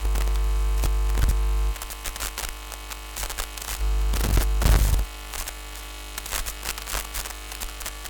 tone buzz noise steady interference buzz resonant right with noise hits tight loop; sonniss gdc